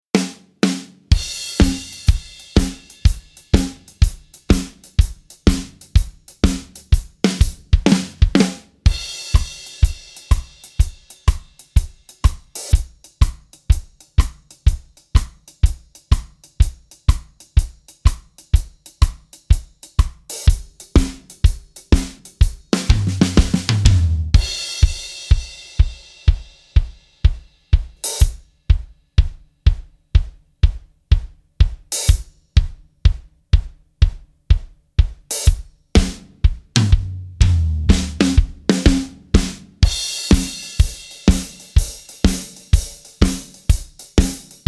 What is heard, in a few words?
country, acoustic drum loops; presonus